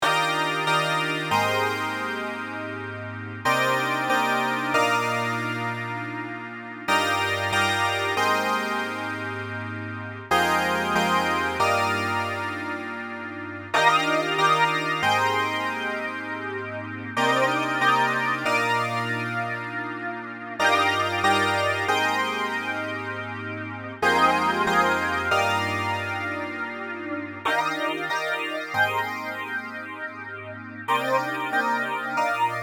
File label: Fantom SBF-325 MFX